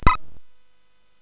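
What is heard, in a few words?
Nebulus, MOS 8580 SID chip music, games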